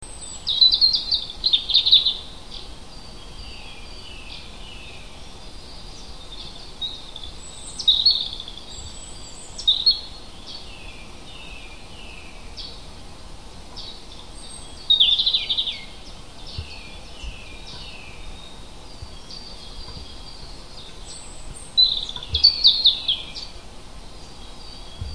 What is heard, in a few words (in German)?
vogel heimisch kurz; biologie; wald; april